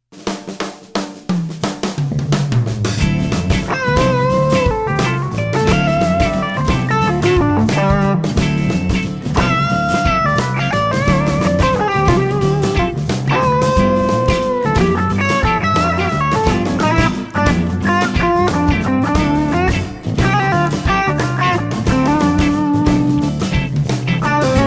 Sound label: Rhumba Blues; Fuchs